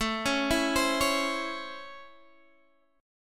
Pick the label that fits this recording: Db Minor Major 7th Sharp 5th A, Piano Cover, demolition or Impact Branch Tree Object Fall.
Db Minor Major 7th Sharp 5th A